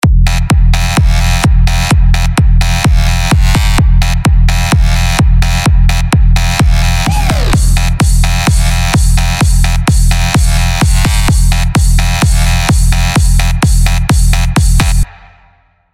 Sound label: edm drop